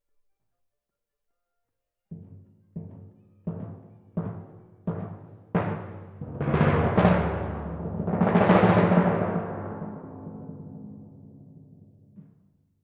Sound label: Twin F Timp